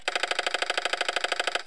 Spin Up Search; activegs ios

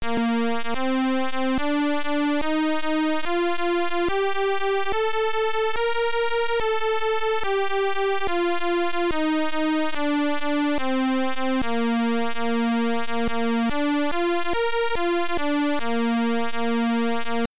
B flat scale; ksynth

